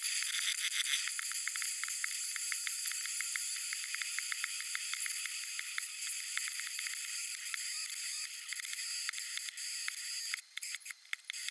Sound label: ephemeral percussion, Air; Rhythm; Gallus/Frankfurt; Christoph Collenberg